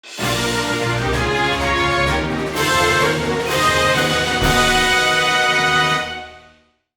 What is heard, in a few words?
Opening Jingle; Space Land